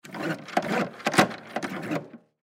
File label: Paper Towel Dispenser; Paper Towel Dispenser Manual Push Down Multiple Public Bathroom; Kitchen; Industry; Blastwave